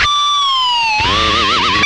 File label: whammy fx, guitar